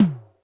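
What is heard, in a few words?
ELECTRIC tom low; Greytsounds VOL-1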